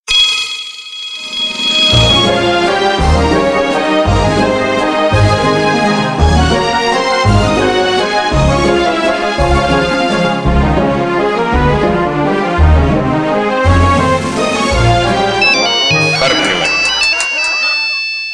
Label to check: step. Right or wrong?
Wrong